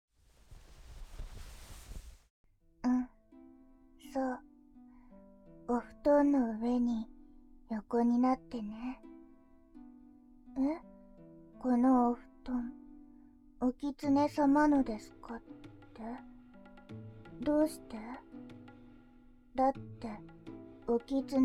お耳ぺろぺろ

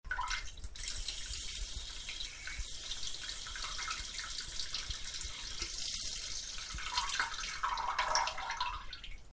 しょーゅーぱちゃちゃおしっこ

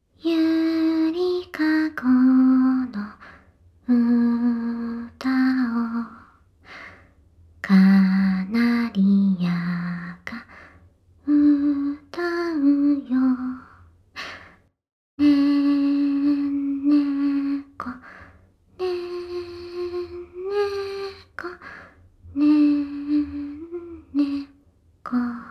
ゆりかごのうた

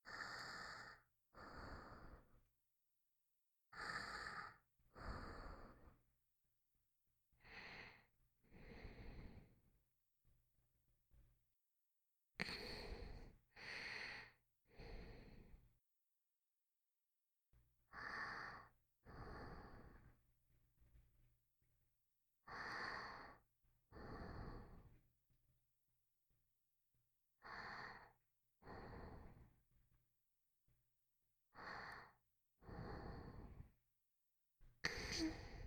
添い寝ループ

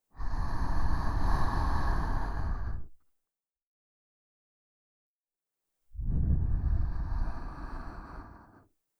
吐息

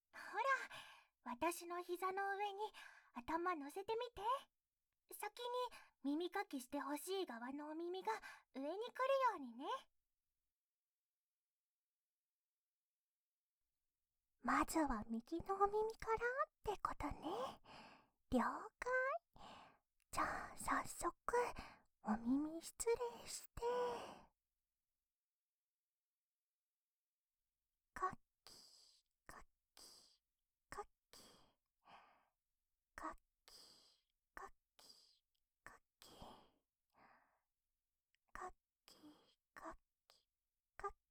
右耳 耳かき・お耳ふ～ふ～・梵天（効果音なし）, ASMR

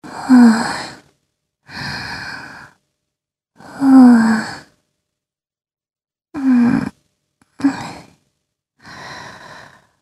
寝息; 男の娘といっしょ ぷらすあるふぁ; SEなし版

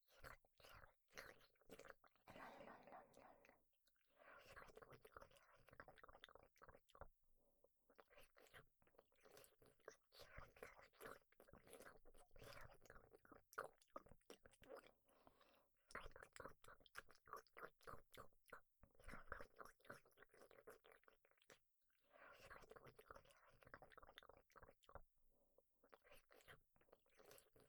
フェラチオ モノラル; フェラチオα

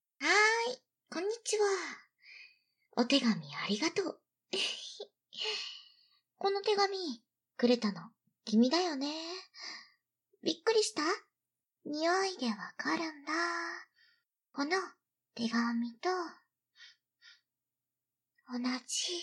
焦らし耳舐めカウントダウン

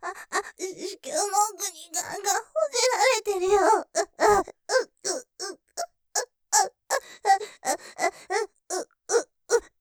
喘ぎ ピストン喘ぎ; 子宮の奥・ガンガンほじられる・ピストン喘ぎ; ステレオ

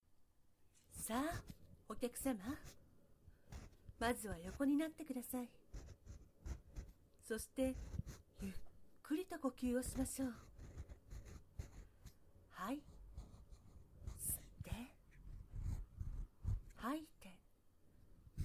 癒しの耳かき, ASMR

